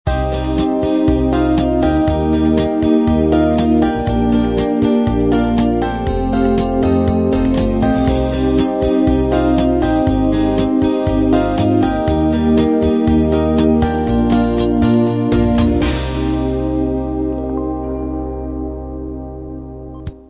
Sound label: unplugged 8beat end